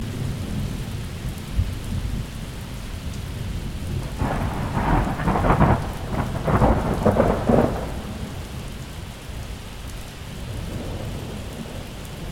complete summer storm with thunder

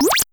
blip

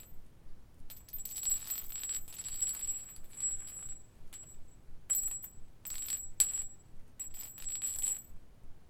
ringing metal piece on hard floor

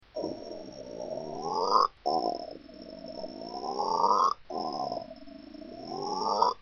Fat Frog

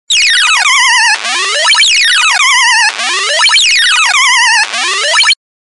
8bit

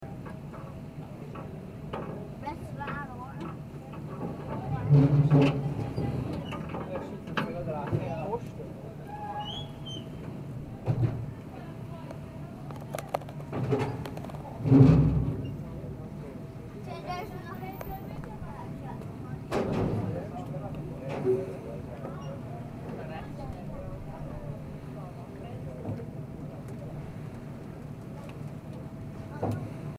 boat landing at a wharf

